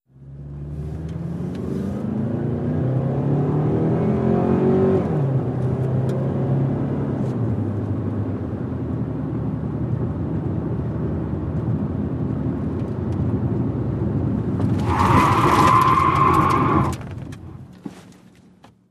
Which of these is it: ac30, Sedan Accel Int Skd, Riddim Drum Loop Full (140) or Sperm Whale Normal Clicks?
Sedan Accel Int Skd